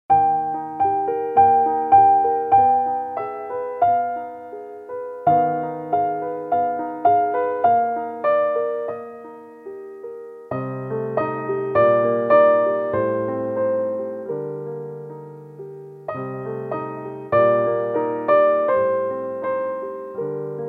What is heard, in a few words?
alesis prestige artist